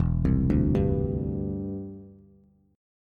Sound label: E Minor 7th Sharp 5th E, Bass; arpeggiated